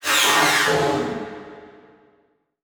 Transition Whoosh Warble; Production Element; Title Transition; AdobeCC

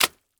Liquid Water Mop Wet Smacking Concrete

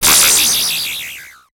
Transition Digital Distortion; Production Element Title Transition

